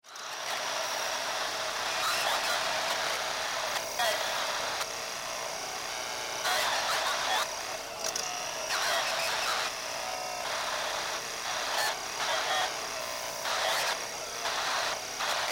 Science Fiction Spaceship Cockpit After Crash Damaged Communications